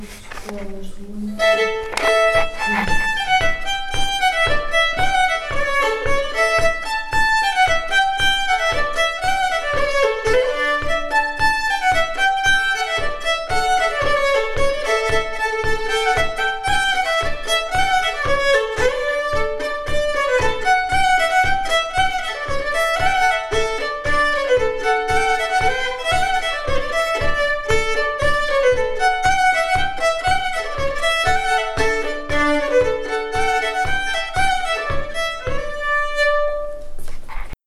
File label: Polka; Rassemblement violons FdA 2017; Polka de naves